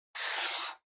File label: Pencil Straight